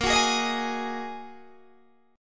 A sharp 7th Suspended 4th Sharp 5th, Guitar, DADGAD; 20,21,18,20,18,18